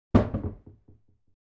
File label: wooden door closes